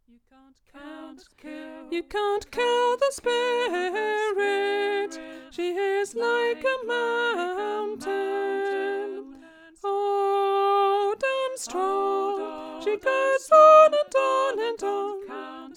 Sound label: Polyphony voice